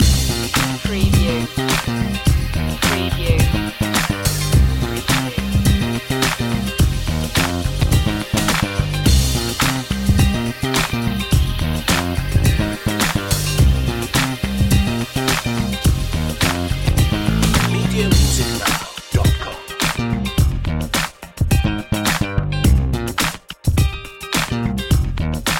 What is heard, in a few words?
funky loop